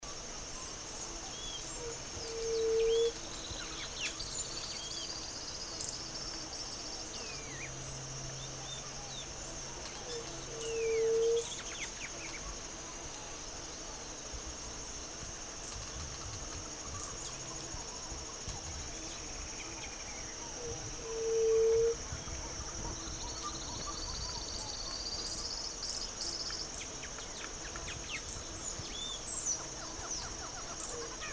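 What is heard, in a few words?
Yerutí Común, Leptotila verreauxi, White-tipped Dove; cantos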